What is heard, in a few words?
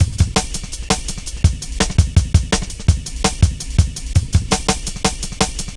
Drum Loops, Jungle